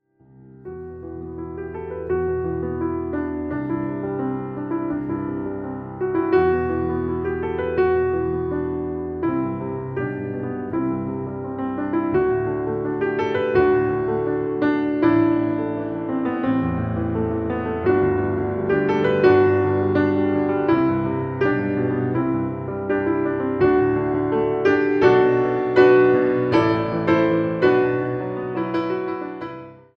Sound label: Swedish Piano Songs